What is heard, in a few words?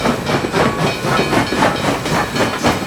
Steam Loop; Busy people of hamsterland; rail